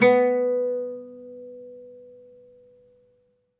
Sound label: OUD B2 -R; E-MU Producer Series Vol. 7 – Old World Instruments